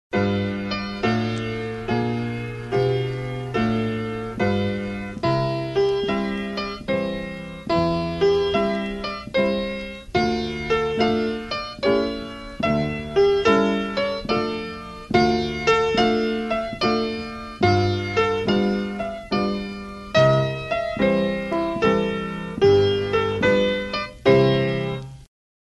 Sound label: waltz clog slow